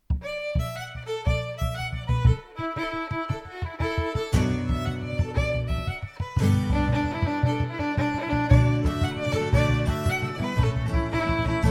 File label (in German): Instrumental Jig Geige Bodhrán Gitarre